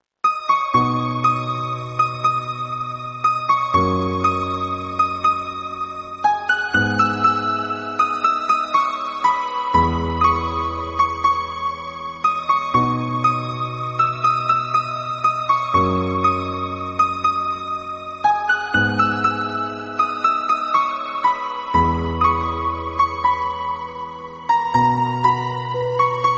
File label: Bgm Instrumental